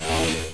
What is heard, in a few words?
sab swing